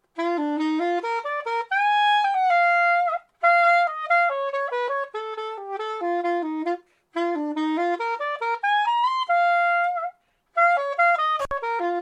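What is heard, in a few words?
Soprano saxophone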